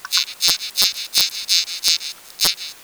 pond

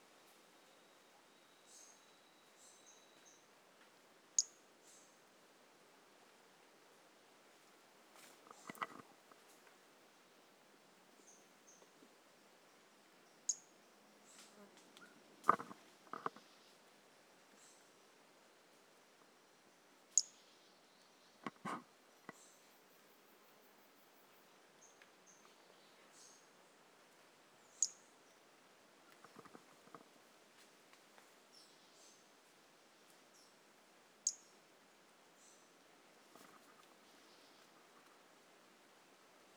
Brachycephalus mirissimus; A E Confetti

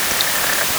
pond

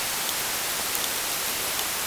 pond

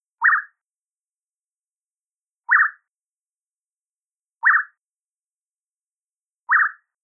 Male Low whee-oo

